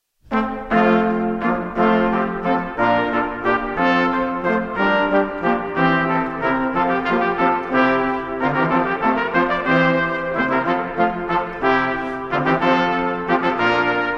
Traditional Gloucestershire Wassail (arr. Brass Quintet)